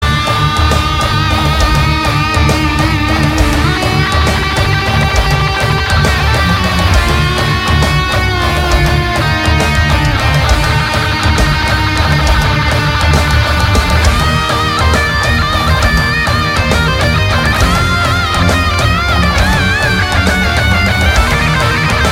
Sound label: Guitar Bgm; Urimai